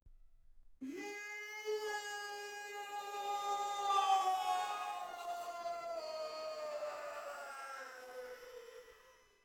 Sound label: cinematic yell of, deity